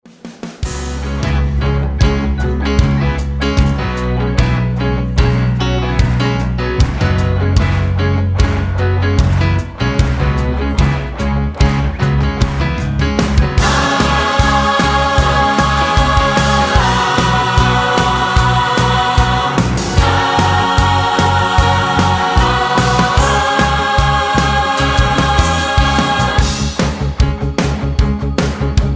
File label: Gospel Choir